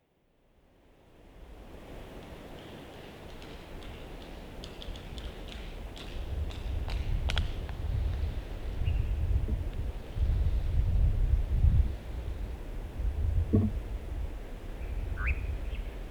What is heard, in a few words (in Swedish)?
nattskärra; fågelljud